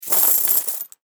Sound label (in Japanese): 財布ひっくりかえす